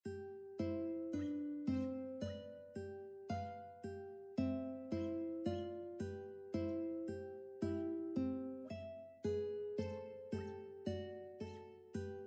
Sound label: duet ukulele sonatina; Sonatina by Johann Anton André; classical ukulele fingerpicking duets